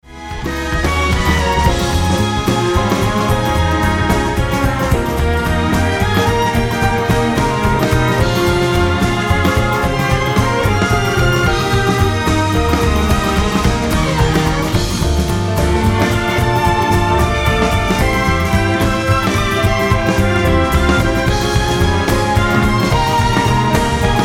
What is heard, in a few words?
Strings